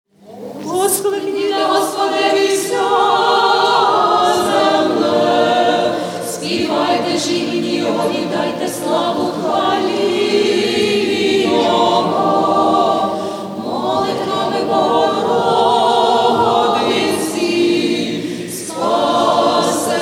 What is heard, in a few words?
ukrainian chant for meditation and prayer from a live ukrainian greek catholic; cathedral